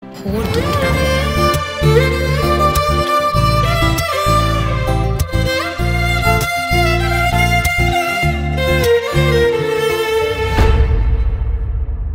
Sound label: Instrumental